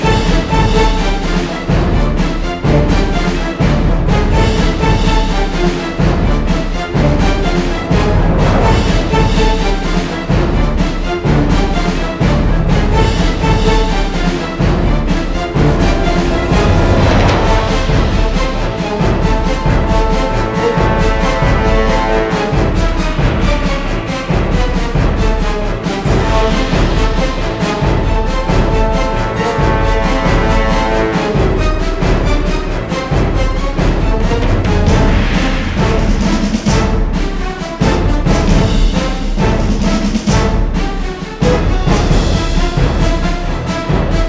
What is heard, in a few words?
music boss